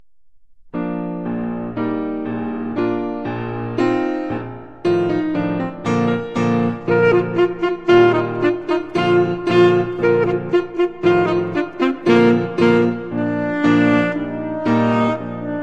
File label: hungarian dance full speed